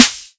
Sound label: Snare; Vengeance Samples